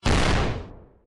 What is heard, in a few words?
small Explosion; Zomboid 41.78.16; Project Zomboid